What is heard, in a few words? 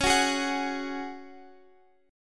Db Suspended 4th Db, Guitar, Open F; Dbsus4 strummed; x,x,13,13,11,13